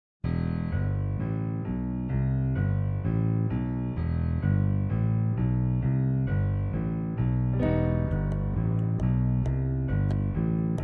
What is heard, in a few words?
Nuevo Tango Ensamble